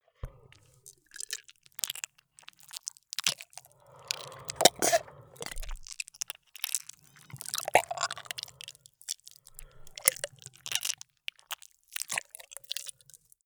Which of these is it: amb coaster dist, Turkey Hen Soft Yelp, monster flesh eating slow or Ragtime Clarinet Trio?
monster flesh eating slow